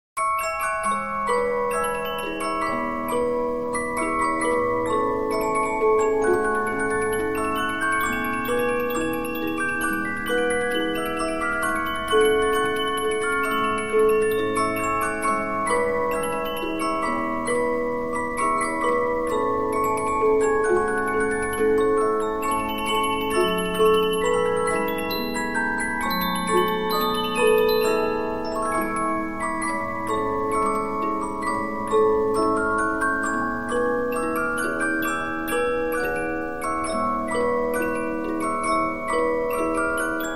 Polyphon; musicbox 15inch

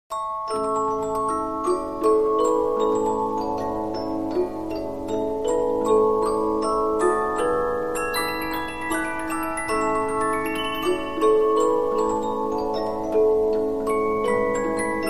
Polyphon, 15inch disc musicbox

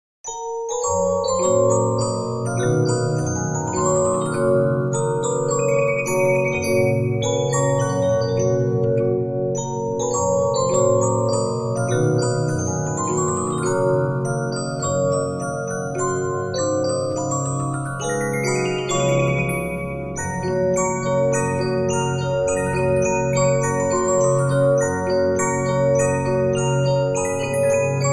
Regina; 15inch; disc musicbox; SouthernGirl

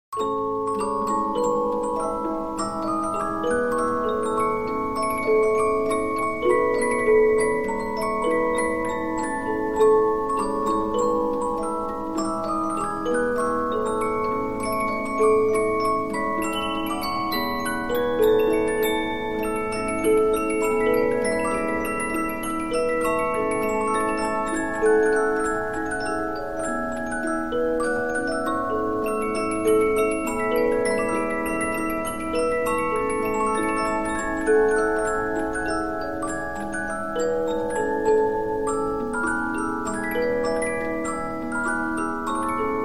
Polyphon; musicbox 15inch; CrossPolkaOfStelltos

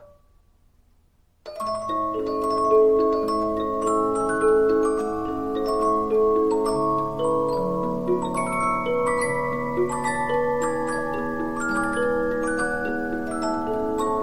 Polyphon, musicbox; 15inch; Geschichten Aus Dem Winer Walz; STRAUSS

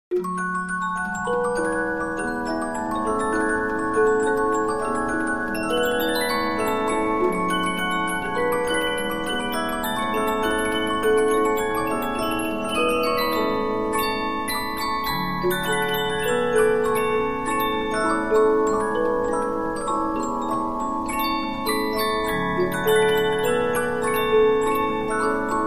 Polyphon; musicbox 15inch; LesPhalene

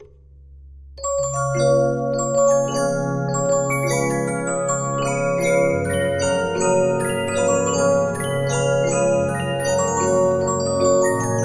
Regina, 15inch disc musicbox

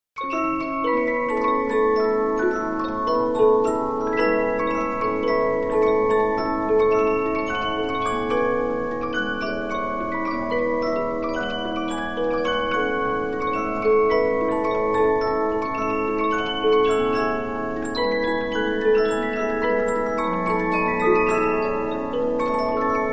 Polyphon, musicbox 15inch

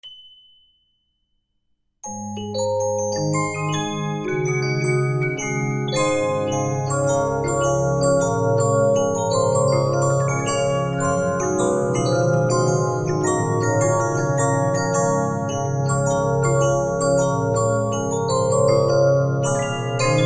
musicbox 15inch, Two Step; Regina; disc musicbox

